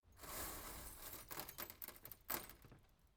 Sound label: sweeping glass shards